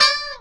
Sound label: GTR BLU, FUNK GTR; E-Lab; X Static Goldmine 2; akai